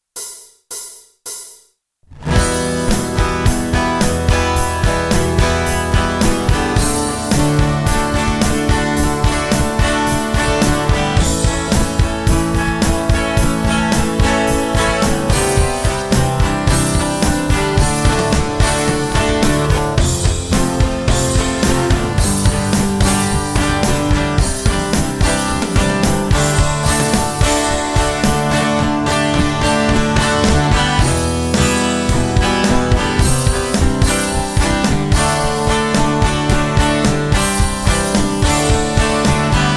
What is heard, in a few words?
music acoustic guitar; bibis house of kabob